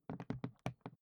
briefcase plastic handle handling quick soft; industrie wirtschaft; bueroarbeit

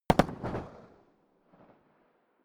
rifle ak-47 exterior fire double distant; comedy cartoon; pistolen gewehre; Pistols and guns

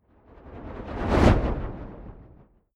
fire whoosh flutter fast; swooshes-swishes

